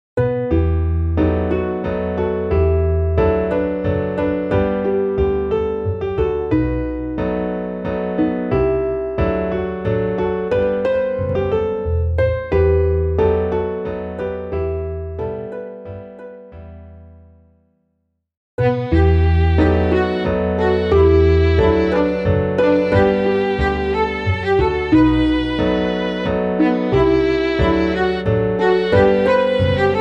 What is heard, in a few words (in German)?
midi klavier; die nixe